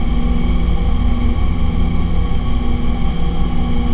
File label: DRONE